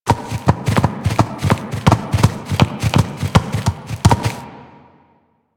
Ram Walk Loop; Animals; DE; Warframe; Quests; Duviri

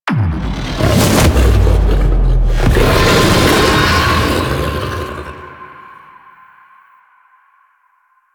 Finisher One Fail; Warframe; The New War; Archon; Amar; Finishers

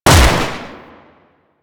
Lever Action Rifle Shot; Grineer

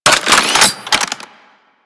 Anti Aircraft Gun Equip; Weapons; Warframe; Archwing

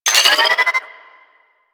Space Port Cargo Scanned Beep; Venus; Objective Rooms

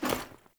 Foley Action Armour Melee Swipe; Characters; Player; Deora Armour

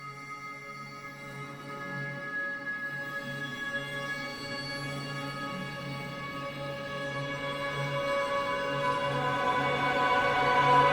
River Scene Cinematic Cue, Music; Young Deora; Fable Of Garren Rood

